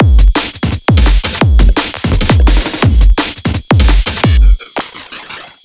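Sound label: Percy Beat C, drum bass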